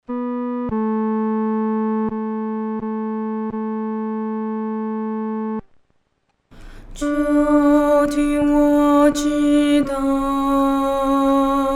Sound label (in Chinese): 独唱 第二声; 垂听我祷告